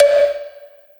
Perc, Gated Reverb; musicradar

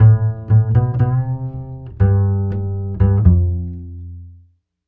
Upright Bass, Ukraine Gypsy; Sonic Foundry; Continental Drift Aftershocks

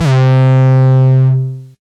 808 Dist - C3, Kicks; Drums; MBase 11 - 808 Dist

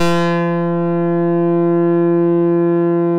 EMU MODULAR, modular syn; Sonic Reality; Vintage Timetraveler

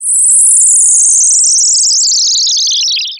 Space FX One-Shots; Rondo Brothers Trip-Hop Loops & Samples; Hooked On Hookers

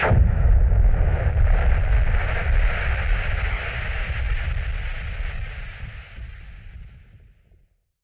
Noise Bomb Boom Filter; Noise Bombs; musicradar; bomb factory

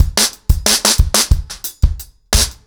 90BPM, acoustic drums, drumloops

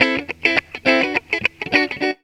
GTR, GTR RIFFS, Funky Elements; Zero-G

